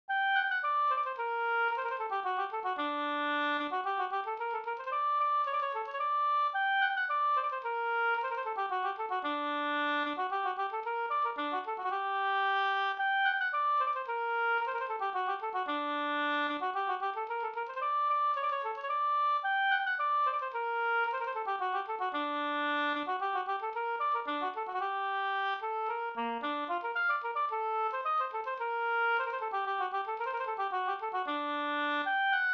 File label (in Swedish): polska Bingsjopolska gmollpolskan; e Pekkos Per e Pekkos Helmer